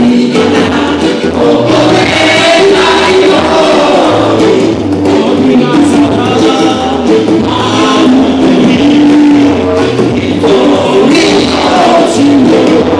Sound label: the pan pipers from solomon islands have the crowd singing along